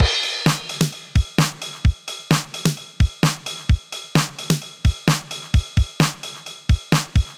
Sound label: 707 130 Groove, Drum Machines; Ableton